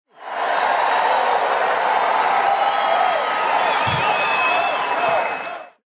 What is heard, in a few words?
crowd noise; trump